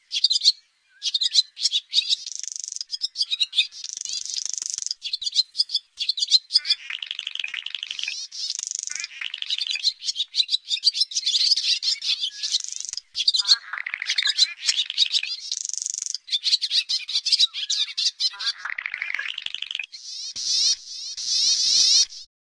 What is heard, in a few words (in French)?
hirondelle, oiseaux